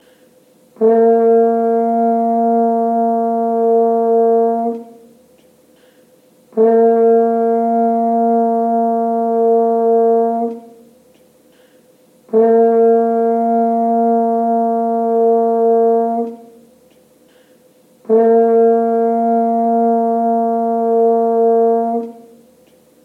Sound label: french horn f